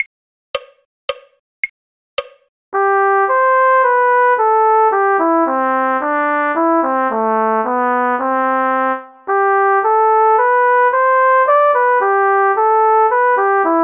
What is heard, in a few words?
cornet; sweet polly oliver; folk